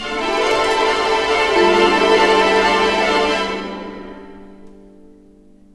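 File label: 128, orchestra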